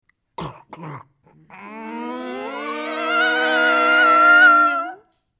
ouverture porte grinçante

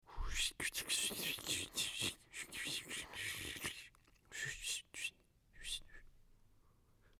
frottage de main gel hydro; Liquide; Gel Hydroalcoolique; mouth-fishing

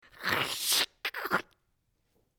bruit de scotch qui se dechire; Fourniture

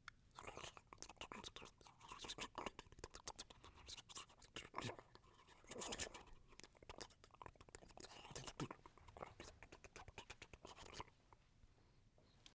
mouvement drapeau; Supporter; mouth-fishing

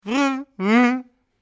fermeture élcair; Fourniture; fermetture éclaire

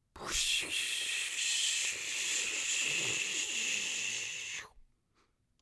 douche; Liquide

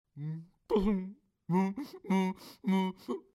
chaise métal avancée assis dessus; Mobilier

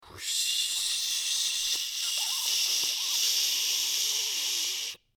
eau haut debit; Liquide; Robinet; mouth-fishing

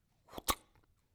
écharpe qui fouette; Supporter; mouth-fishing

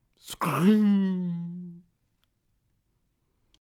ouverture micro ondes; Machine

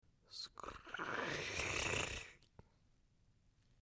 fermeture rideau

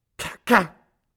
chaise bois tombée, Mobilier

